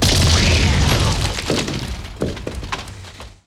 EXPLO; Best Service; Advanced Mega Effects

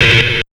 HARD 130 FX, Big Beat Drumloops; USB Soundscan vol.25; AKAI

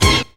DISCO FX; Zero-G; Funky Elements

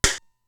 Simmons SDS-9 RM

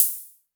Hi Hats Open; LIMAT Div Dept

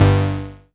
Fuzz Moog Bass; Big Fish Audio; Old Skool House; OSH Bass

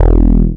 Ind Bass, Synth Bass One Shots

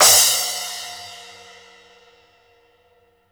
CRSH SPLSH, cymbals; Sampleheads - New York City Drumworks VOL-1; SW CYMBALS

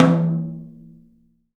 TOM, Studio Toms; 3D Percussives

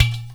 udu, percussion; InVision Interactive; Percussion Slam

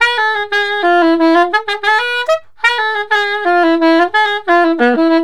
SAX, Balkanish Traditions

